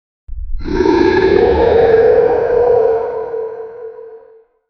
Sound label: epic dinosaur roar; dinosaurs